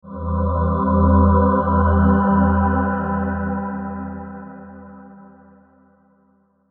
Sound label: M7 first inversion